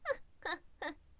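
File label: girl, "fufufu"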